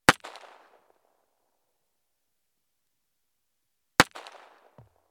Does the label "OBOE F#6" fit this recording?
No